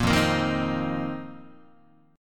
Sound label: F 7th Suspended 4th F down Guitar; strummed